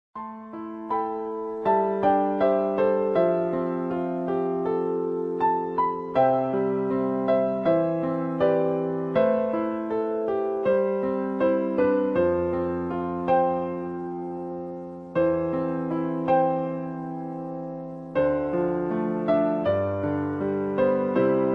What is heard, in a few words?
piano solo